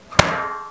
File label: close